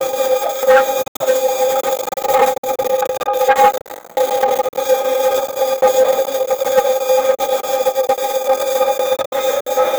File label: scifi ufo cinematic